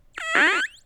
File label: Cracklebox Odd Doppler